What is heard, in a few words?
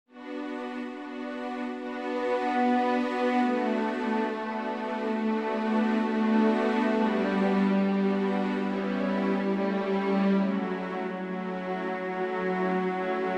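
Vocalise Bm, Full Orchestra Accompaniment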